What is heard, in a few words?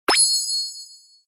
anime magic sound effect